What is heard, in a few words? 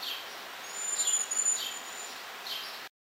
cedar